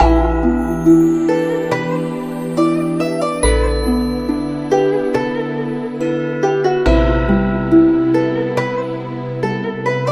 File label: music login